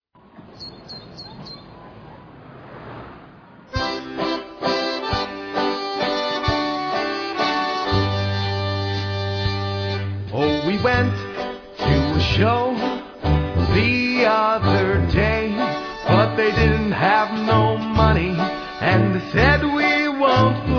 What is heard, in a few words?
polka band